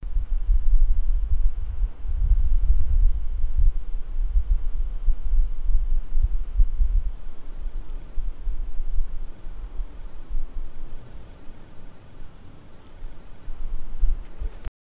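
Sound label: wild western town ambience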